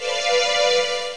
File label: key; Museum of Anything Goes